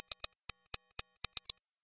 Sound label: morse high